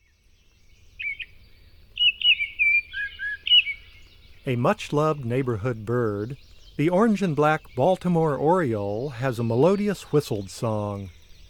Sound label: Baltimore Oriole; North America; Common Western Species; Know Your Bird Sounds